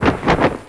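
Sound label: change style; 5element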